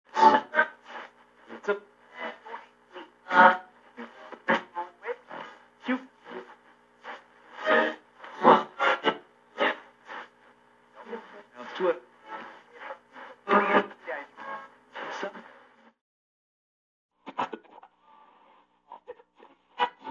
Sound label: radio tuning; radio signals